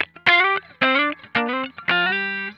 GLIDE, Funk Guitar; Zero G - Funk Guitar

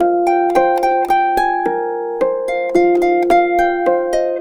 F 110, Complete Harps; USB Soundscan vol.62; AKAI

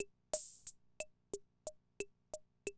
CR78, DrumLoops

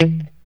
POP E 3, STRAT guitar; Spectrasonics; Hans Zimmer Guitars VOL-1; JC-STRAT POP

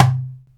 DUMBEK, ETHNIC PERC; Instruments & Percussions; InVision Interactive; Lightware VOL-1

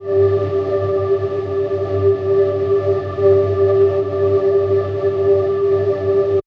pad; M8

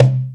Percussion, Drum Machines E-MU Pro-Cussion

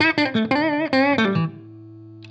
BLUES GUITAR

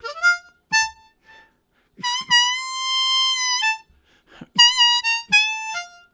High Bender, Harmonica Riffs; Zero G

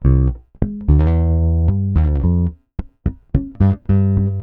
Pop, Bass

